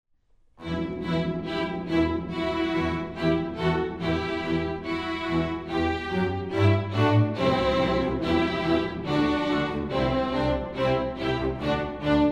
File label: orch